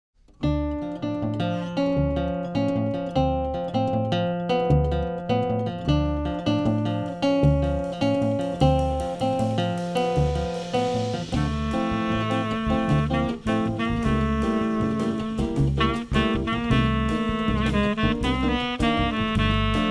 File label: quartet, latin ensemble